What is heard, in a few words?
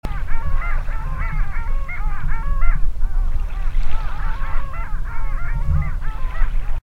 Clangula hyemalis